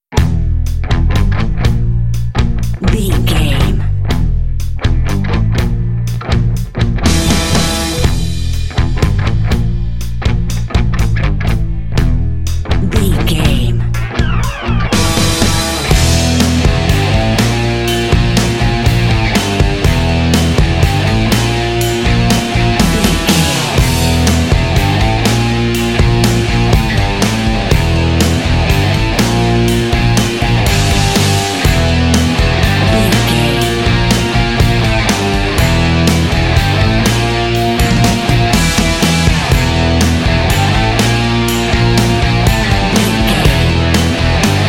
raw rock n roll

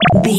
retro laser gun shoot

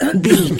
voice male cough interrupt

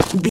footstep gravel run

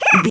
goblin fairy effort jump action

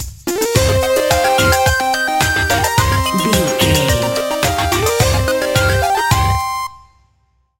retro musical stinger

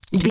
gun pistol general handling

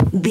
footstep wood walk

